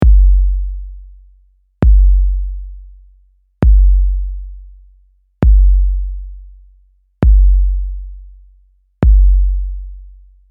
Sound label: Groove